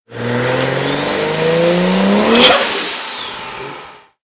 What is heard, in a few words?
turbo boost; ringtones